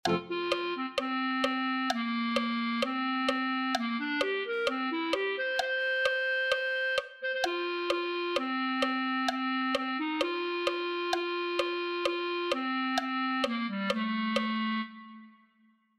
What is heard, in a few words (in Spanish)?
clarinete